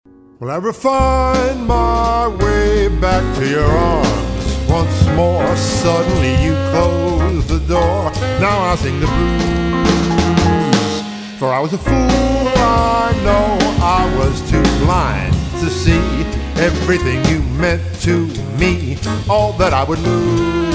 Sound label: Melodious Funk